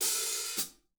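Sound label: hat open close, drum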